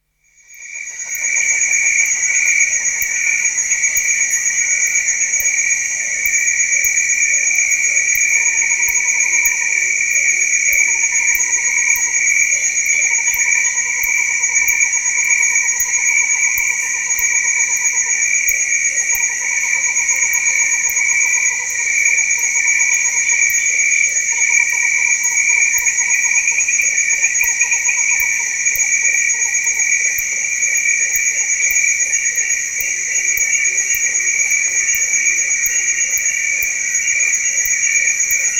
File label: Deep In the Rainforest; Dr. Sound Effects; 210 Nature Sounds - 20 Hours of Relaxing Natural Ambiences for Meditation and Sleep